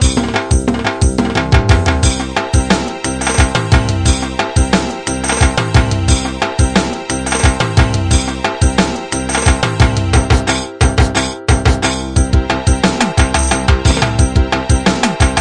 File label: garba mix, grooves